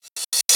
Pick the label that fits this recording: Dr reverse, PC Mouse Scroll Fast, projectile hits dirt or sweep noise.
Dr reverse